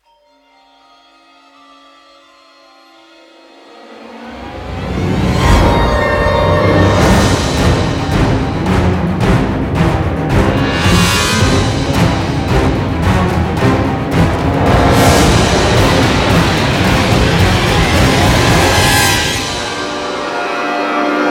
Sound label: Cutscene Orchestral Horror; Monster Hunter Online